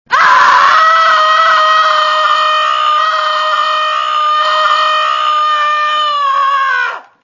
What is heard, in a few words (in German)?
Schrei lang